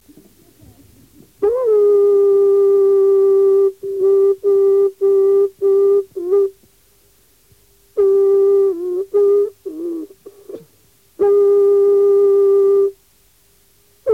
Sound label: kull kull; revisar similar a nolkin